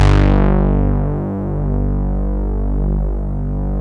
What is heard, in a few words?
POLY X C1, OBERHEIM; Rubber Chicken Software Sample Bank